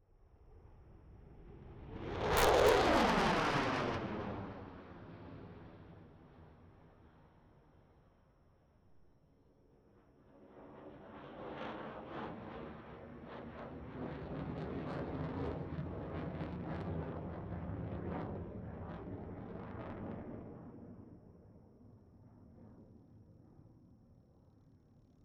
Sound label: F15